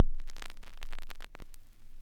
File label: CRCK, Production Effects